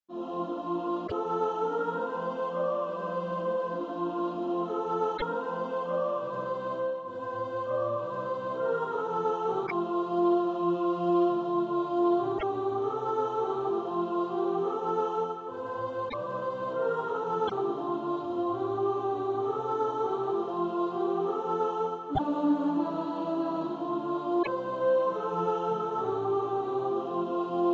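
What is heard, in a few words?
soprano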